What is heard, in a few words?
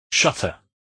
shutter